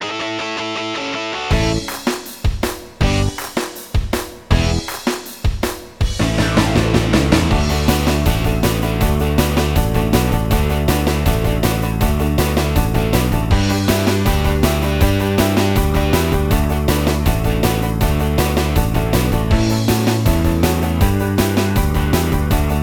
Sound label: ROCK AND ROLL, SINGBACK; BULGARIAN